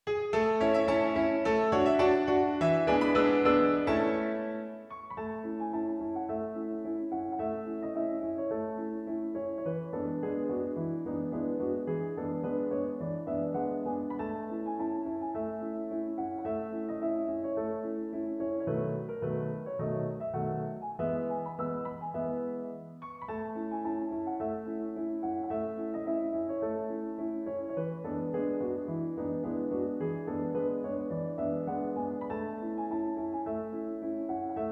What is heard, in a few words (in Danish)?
Gallopader for Pianoforte; af H. E. Lumbye